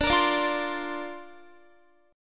D-Minor 7th Sharp 5th, D, Guitar, 4E, 4A, 5D, 5G, 5C, 6F; strummed